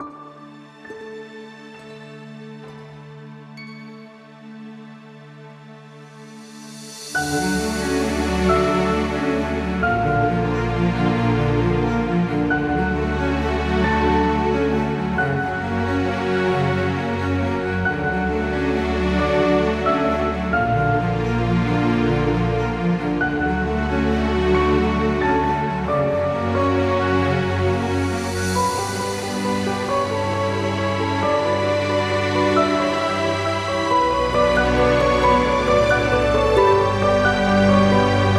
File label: Inspiring Adventure Cinematic Background